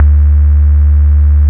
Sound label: LOW BIAS BASS, Bass Analog & Synth; USB Soundscan vol.12; AKAI